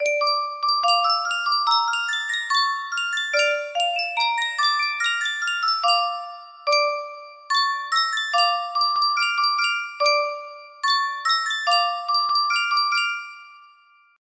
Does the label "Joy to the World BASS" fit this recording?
No